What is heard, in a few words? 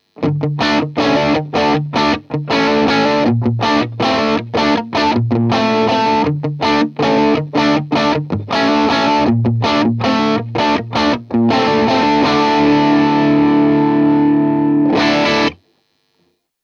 AC30 TS808